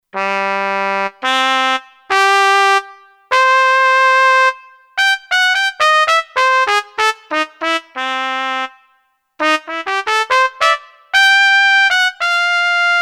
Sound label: Dynamic Trumpet, Kurzweil K2500X; Factory Programs